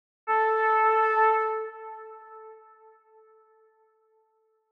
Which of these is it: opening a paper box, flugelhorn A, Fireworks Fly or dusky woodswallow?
flugelhorn A